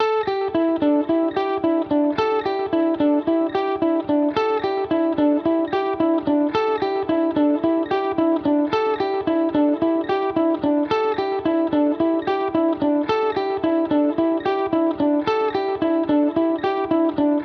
Guitar Lead, 110BPM Emin; 99Sounds; Monosounds; Music Loops; PANDORA BOX!; Waterfall

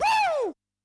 WOOOW; Yamaha DD-65

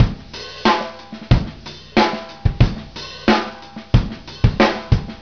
Unprocessed Beats; RnB; GeorgePendergast

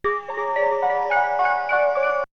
Melody loop; ZERO G - Datafile DISC 1

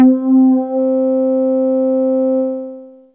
Bass Synt C4, Fairlight CMI IIe Analog

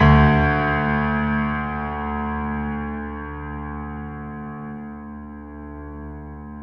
PED FF D, GRAND PED; Best Service Advanced Media Trax Modern Composer

